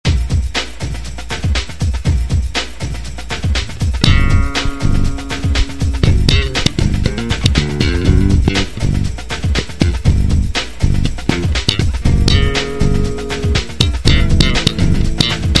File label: bass jam